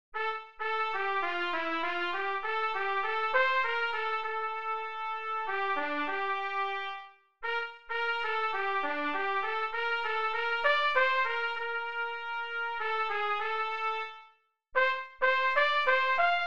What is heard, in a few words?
Trumpet